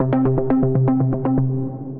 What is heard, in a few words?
Time Runner Bass, Synth Rhythmic; Ableton; Factory Packs; Synth Essentials